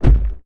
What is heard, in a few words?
hit football; celebration Events